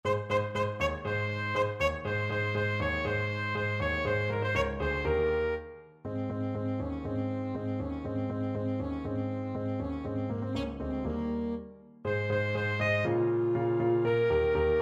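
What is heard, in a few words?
autumn 1st movement, saxophone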